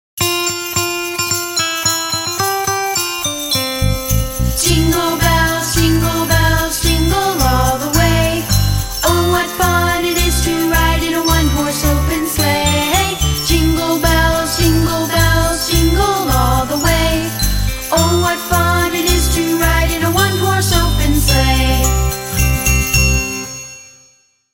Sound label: Jingle Bells; A Beka; Songs we enjoy 3